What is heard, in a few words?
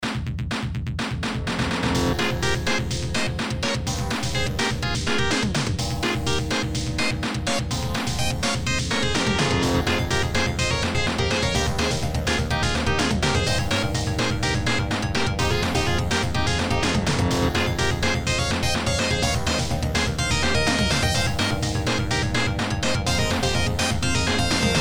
Battle; Jolt Joule